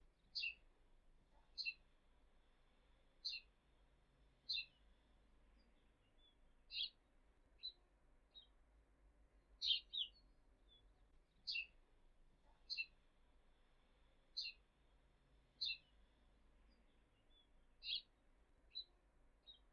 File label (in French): moineau domestique